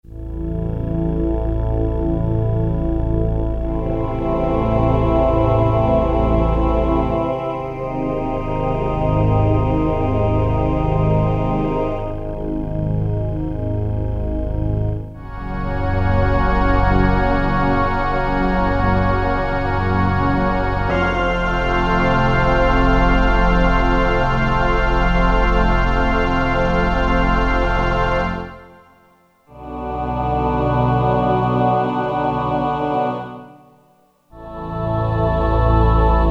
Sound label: VOICEX, Korg DSS-1; Metal-Metalism